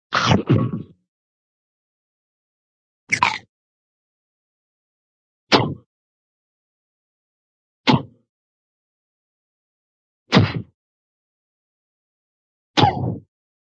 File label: bite, cartoon, big crunch, unbite bone, big chowf